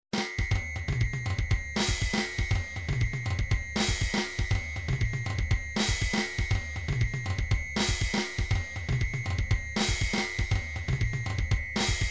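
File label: drums pattern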